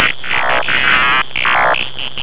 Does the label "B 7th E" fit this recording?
No